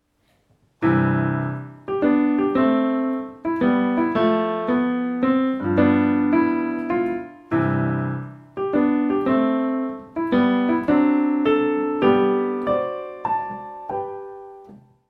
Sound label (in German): rhythmus